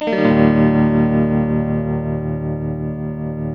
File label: TELTMUMAJ E2, TELE CHORDS, Guitars; Spectrasonics; Hans Zimmer Guitars VOL-1